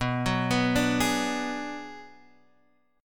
B-Minor 6th B down Guitar Standard; Bm6 arpeggiated; JGuitar

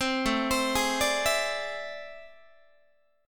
A-Minor Major 11th Guitar, AmM11 chord; arpeggiated; JGuitar

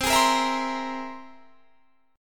C Augmented 7th C down; strummed

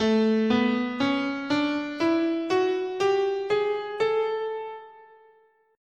A Bebop Dominant, scale

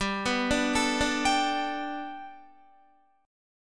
G Major Add 9th, Guitar; arpeggiated; 4F,4A,5C,5G,5C,6E

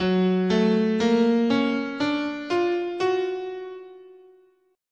F sharp Augmented, scale

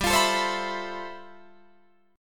G 13th G Guitar Drop D, chord

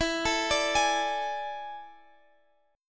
A Major 7th Suspended 4th E

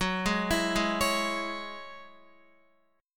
F sharp 7th Suspended 2nd F sharp down Guitar; F#7sus2 arpeggiated

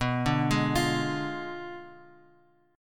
B Diminished 7th B down Bass Standard; Bdim7 arpeggiated

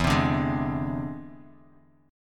E Augmented 7th E down Bass Standard; E+7 strummed; JGuitar

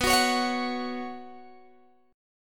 B Suspended 2nd, Guitar, Open G

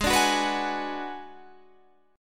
Ab Minor 7th Flat 5th, Guitar, Half Step Down

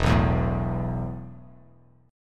E Suspended 4th E, down, Bass Standard; Esus4 strummed; Esus4 chord

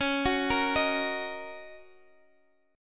D sharp Minor 7th C sharp

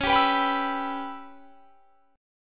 C sharp Minor 7th Flat 5th C sharp; C#m7b5; strummed; 21,22,21,24,24,24

